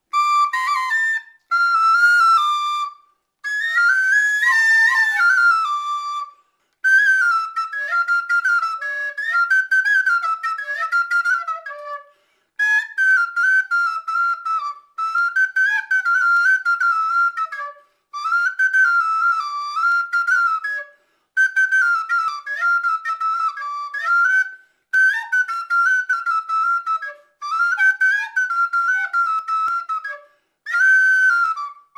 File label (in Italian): tarantella flauto